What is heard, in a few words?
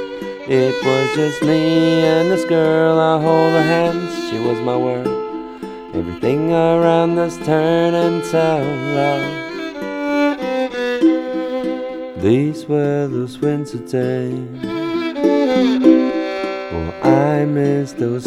folk